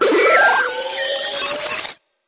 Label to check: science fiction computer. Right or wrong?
Right